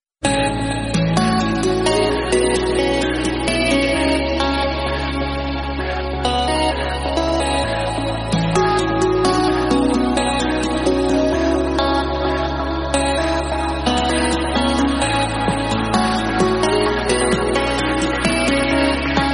awesome ringtone

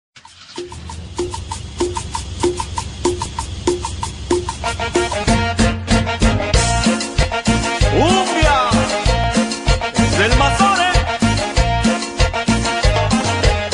cumbia ringtone

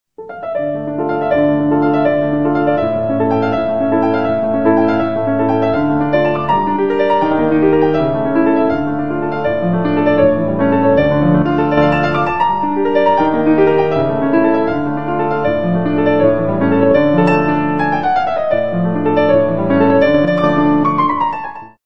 classical piano sonata ringtone

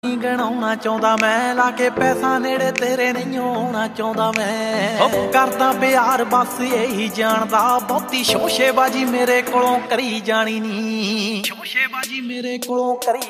punjabi ringtone

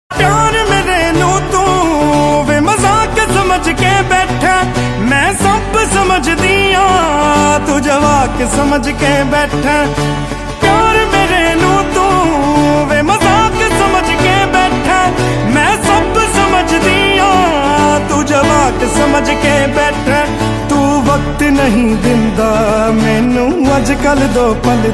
punjabi ringtone

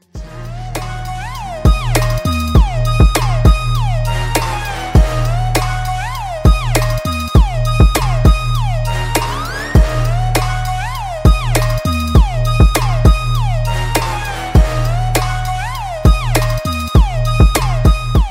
attention iphone ringtone

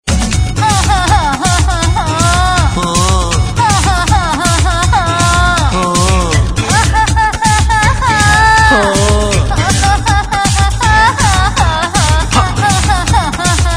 funny beats ringtone

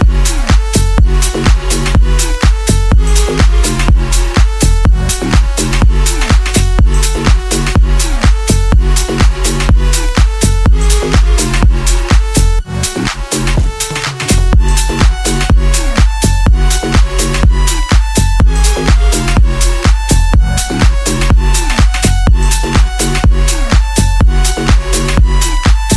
new electro ringtone

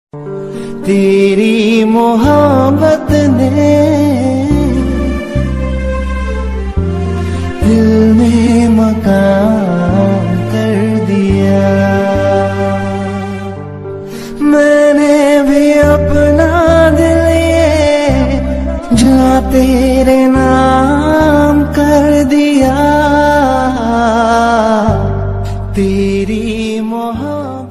sad heart touch ringtone

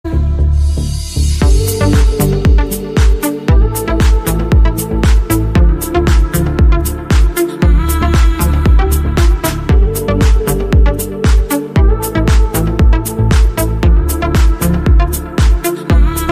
iphone x ringtone mix

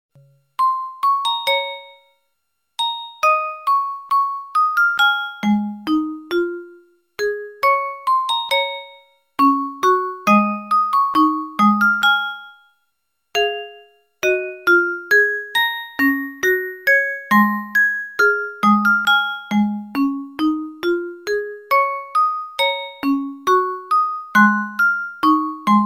music box ringtone

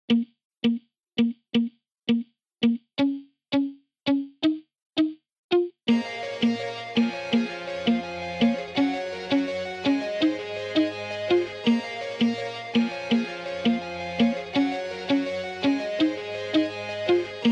beat ringtone

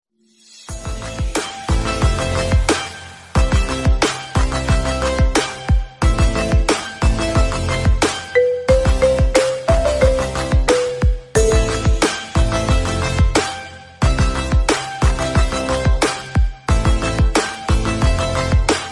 romantic english instrumental ringtone

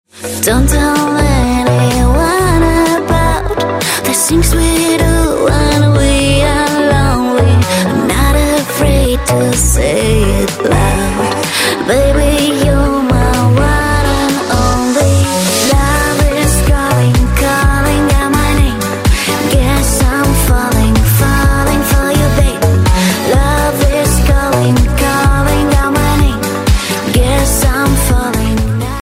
romanian ringtone

